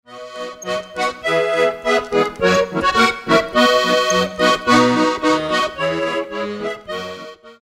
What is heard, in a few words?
jingle